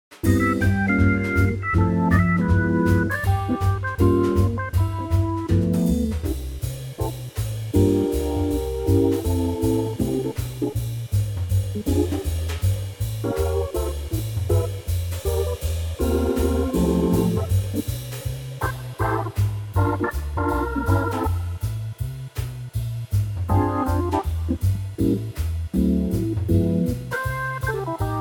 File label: organ C